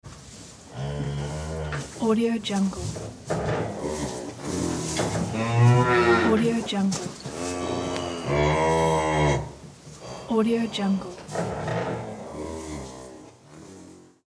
milk cows in station

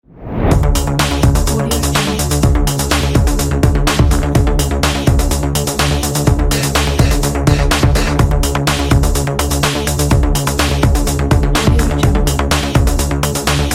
stylish agressive commercial

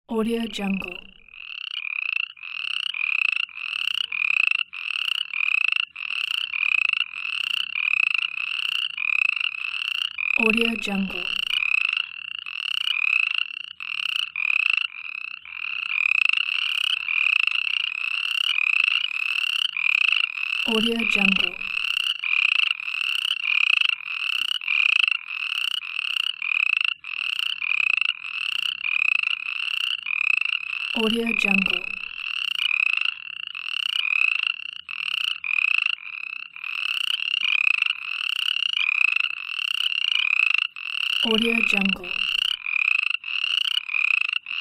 nighttime frog ambience

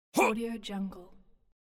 male attack grunt; medusamusiclab

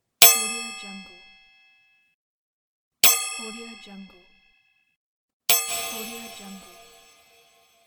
bell ding

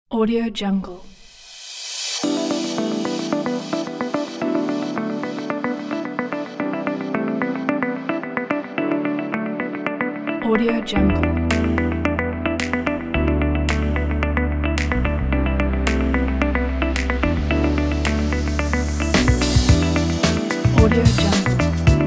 summer corporate lounge fashion; brewsound

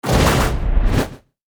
ricochet space bounce

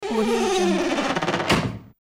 door creaks wooden; hollywoodedge

